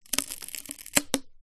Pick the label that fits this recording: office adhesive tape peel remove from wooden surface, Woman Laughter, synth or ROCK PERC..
office adhesive tape peel remove from wooden surface